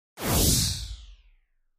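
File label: Laser Gun Blast Descending Sweep; laser fx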